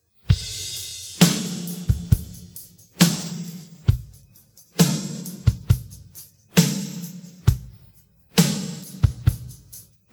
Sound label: pattern, drums